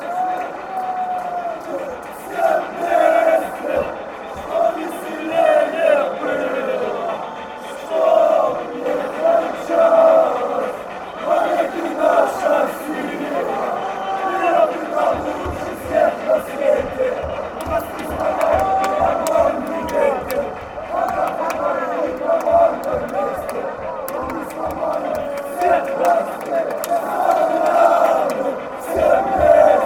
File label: metalist chant